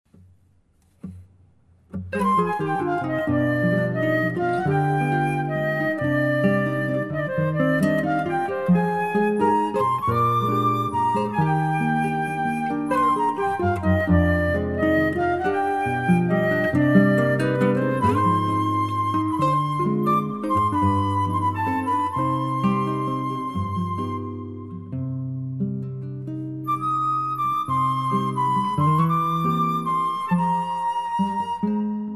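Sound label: flute, C minor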